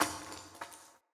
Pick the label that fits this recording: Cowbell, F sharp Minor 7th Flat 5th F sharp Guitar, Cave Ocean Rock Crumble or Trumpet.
Cave Ocean Rock Crumble